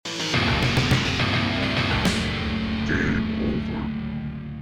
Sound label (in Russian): rok autro s okonchaniem igri v konce